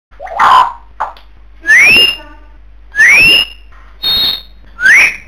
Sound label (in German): pfiff